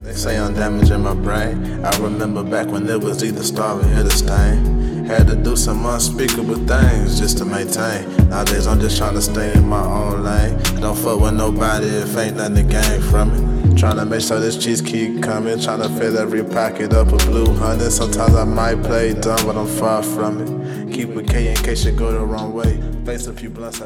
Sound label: Go To Trap